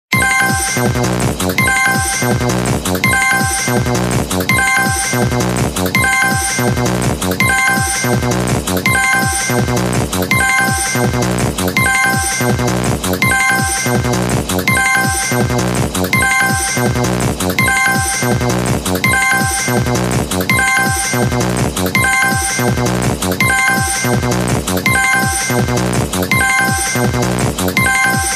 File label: Quiz sound; KR Quiz sound